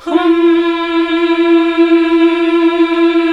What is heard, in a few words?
HMM F2, CLASSIC VOX8